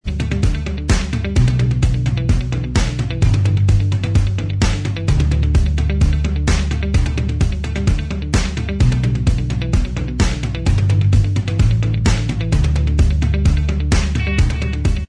Inst

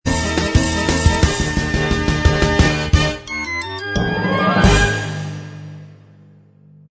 kids tv theme Inst Track

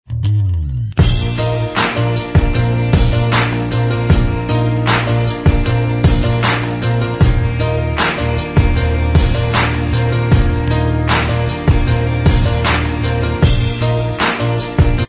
Inst

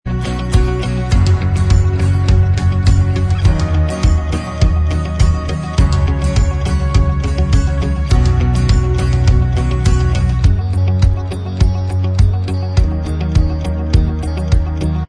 Inst Track

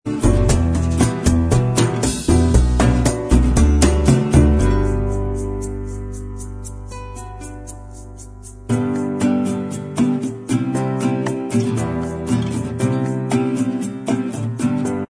bossa mix Inst

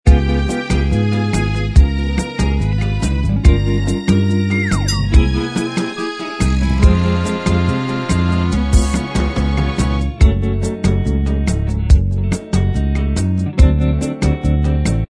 backing track; Hairspray

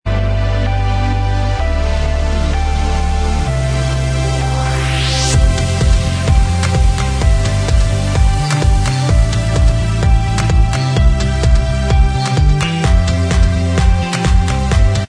Inst Track

